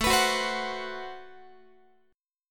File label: A Minor 6th Add 9th A Guitar; x,17,14,16,16,17; strummed; Am6add9